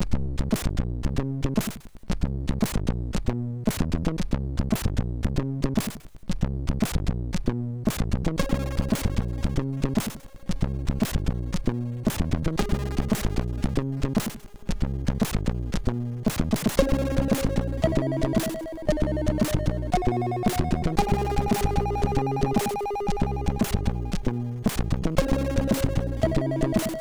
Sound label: MOS6581R2; hvsc